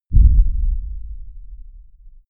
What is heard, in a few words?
bass impact damaged